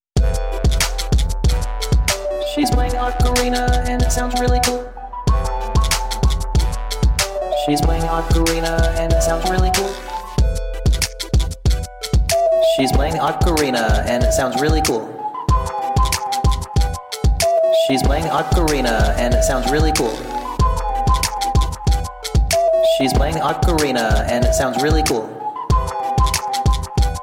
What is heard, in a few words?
ocarina